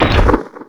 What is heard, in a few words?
Castle Flag; New Super Mario Bros. Wii